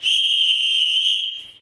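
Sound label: station whistle; tetsuon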